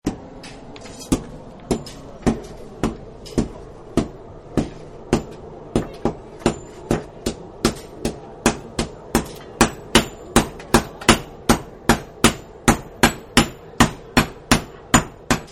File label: Blacksmith, Factory; Factories; Backgrounds